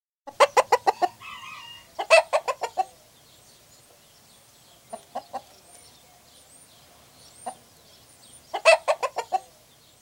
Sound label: Hen cackling; Chicken; Part of Chickens (Sound Effects:Animals); BBC